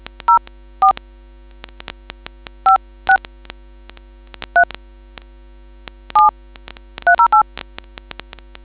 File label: dtmf